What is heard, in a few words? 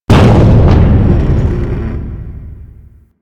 Ship Hit; Explosions; Warframe